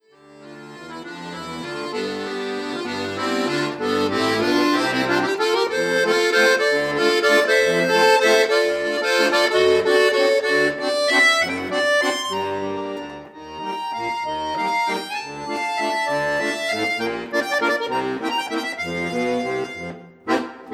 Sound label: accordion